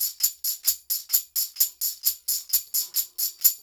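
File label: TAMB, tambourine; Drumdrops In Dub VOL-1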